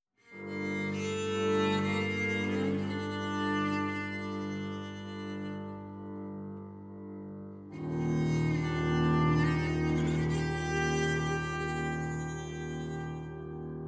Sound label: Exploration General Electronic